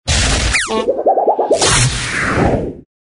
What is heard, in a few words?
comedy wobble bobble